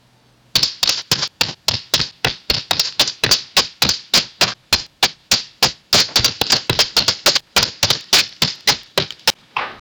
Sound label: battle sounds clashing sw, clashing swords